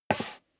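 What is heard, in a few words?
step leather shoes wood creak slide short, footsteps; schritte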